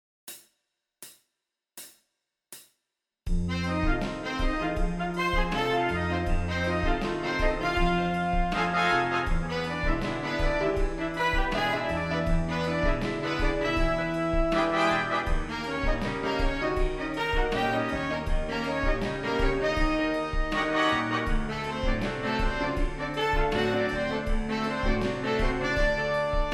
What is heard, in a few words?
Lip Slur 3 Horns in Fifths